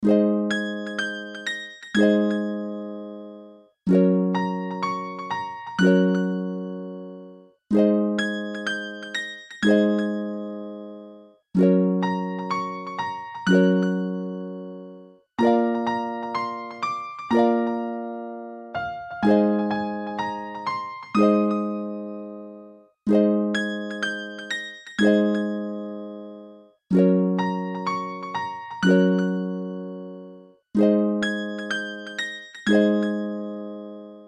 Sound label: magical harp